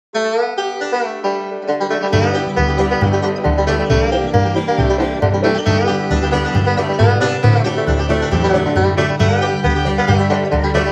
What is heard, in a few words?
Opening Roll, banjo, bluegrass